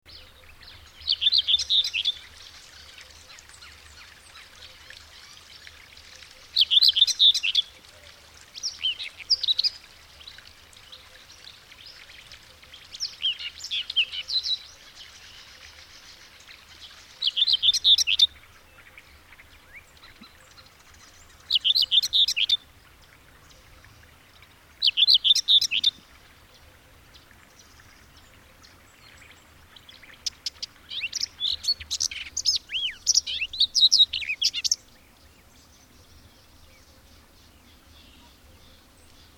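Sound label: Sylvia communis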